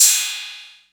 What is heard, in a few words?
Cymbal 808; gate experiments